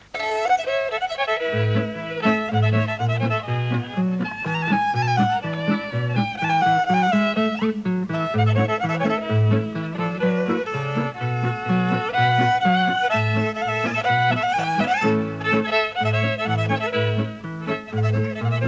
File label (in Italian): polka in la